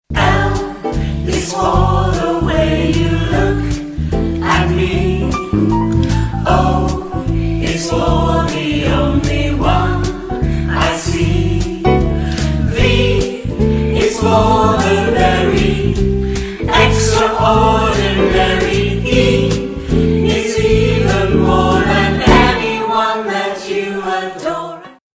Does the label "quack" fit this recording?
No